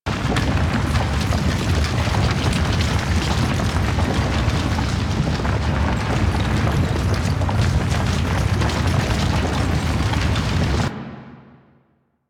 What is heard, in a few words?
Burrow Loop; Ambience; Golden Maw; Warframe; The War Within